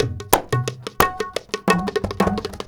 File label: INDIA R, Heart of Asia, IND ENS; Spectrasonics - Heart of Asia CD2